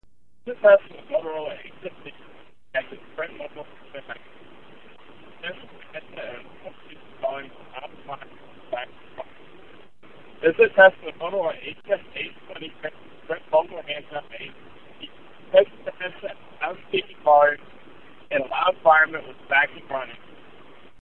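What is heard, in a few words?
Vacuum, headset; Moto HS820; Mogul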